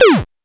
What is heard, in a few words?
PO Synth Gm; SYNTHS; CHIPSHOP DELUXE; CHIPSHOP HITS